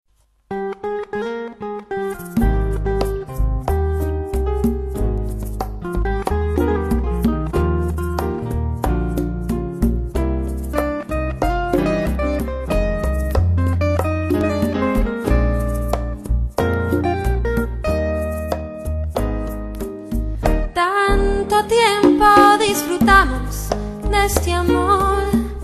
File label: bolero